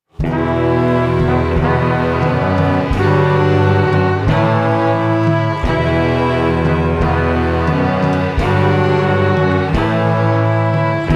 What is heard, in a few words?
Incidental Music